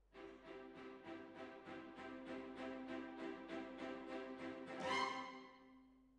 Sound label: Hall